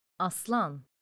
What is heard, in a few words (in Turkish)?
Aslan